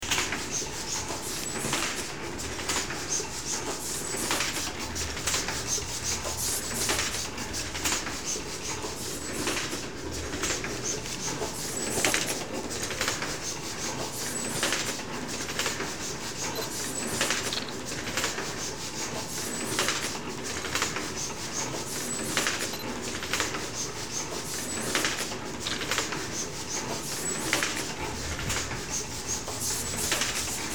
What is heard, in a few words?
printer sound